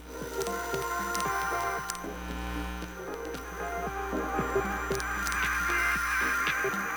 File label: Cell Microphone, electromagnetic sounds; Micro Pack; NazdyNate